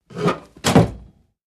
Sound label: Hanging Kick Awy Chai, crashes & creaks